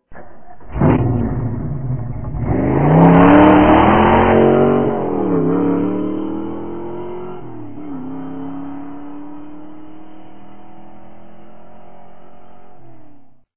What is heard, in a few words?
car